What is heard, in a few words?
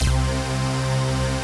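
Rave Synth, Dance Mega Jungle Rave; Best Service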